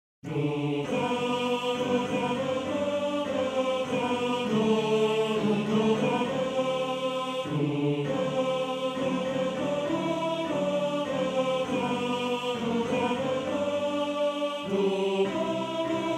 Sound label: Tenor 1, maennerchor; Bajazzo